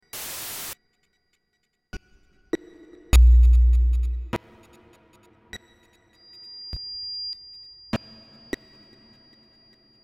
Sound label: clicks